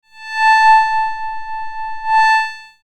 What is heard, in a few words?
Galactic viol 880, synthpad